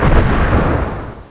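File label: explosion Standard; Chromium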